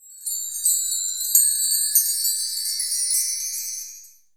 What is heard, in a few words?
Ethnic Perc; Percussion